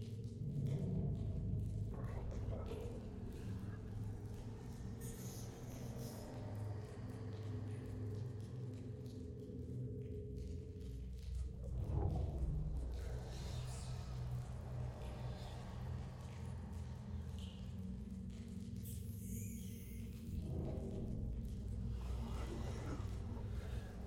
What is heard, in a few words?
amb catacombs; noxus